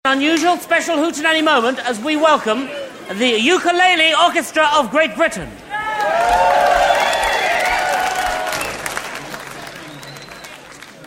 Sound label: Ukulele Orchestra